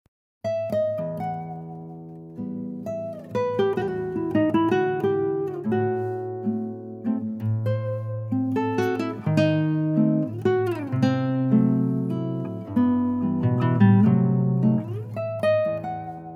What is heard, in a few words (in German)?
Gitarre; trauermusik